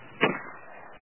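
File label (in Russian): стрельба из винтовки вдалеке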